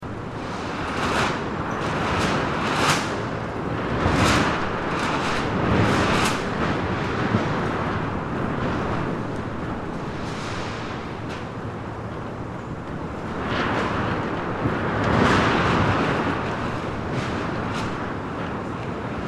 plastic

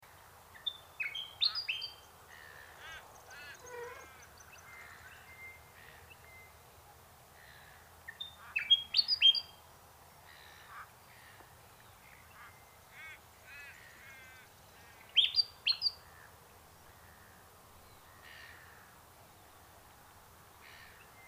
bird unknown magroves sequence

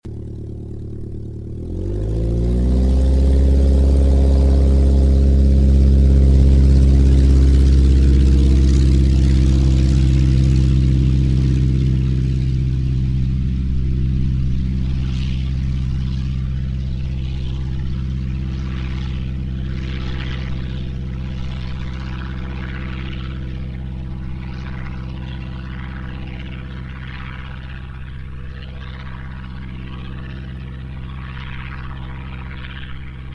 aircraft auster iii takeoff; 1945

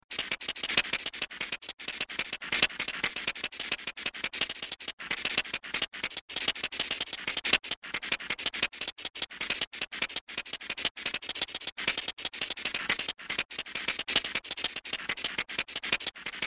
created ambience masonry bytes loop stereo

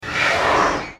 flame gas giant melbourne casino burst

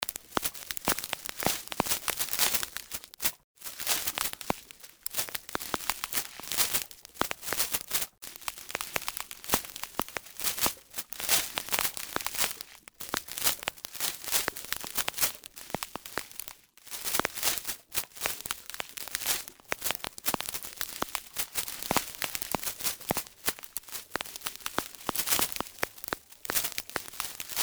ambient texture

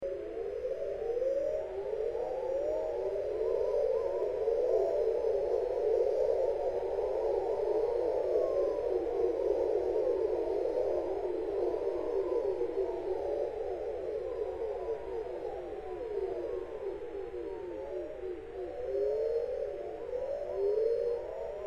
created ambience sunset choir stereo

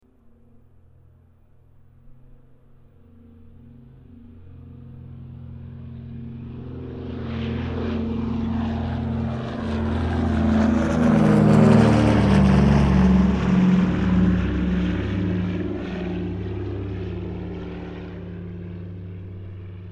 aircraft douglas dc3 takeoff stereo

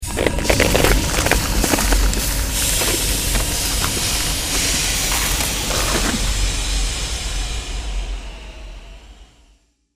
created magic spell blizzard shards stereo

